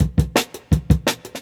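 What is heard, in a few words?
Jungle Breaks, 169 bpm; Hutt; Original Jungle Breaks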